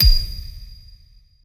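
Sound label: Design misc Kill Confirm Metallic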